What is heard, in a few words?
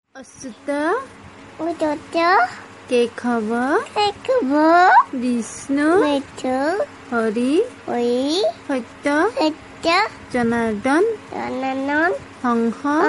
cute baby voice